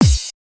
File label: custom kick cymbal